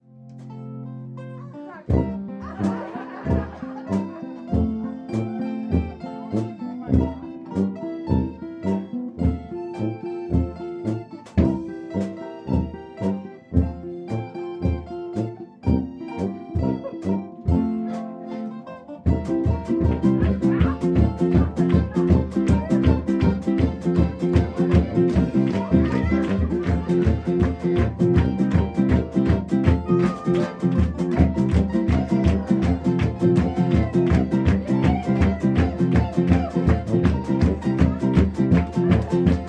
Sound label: polka